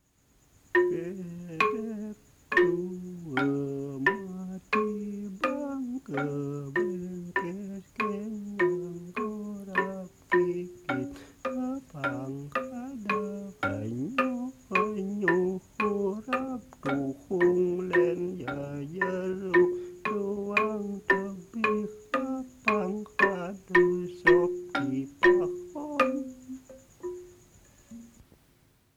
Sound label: Asak kidung gambang